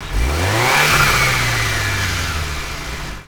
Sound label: rev fade, Engines; E-MU Producer Series Vol. 3 – Hollywood Sound Effects; Ambient Sounds